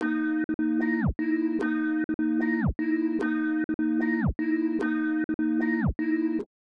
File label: Dark Eb 150BPM, trap beat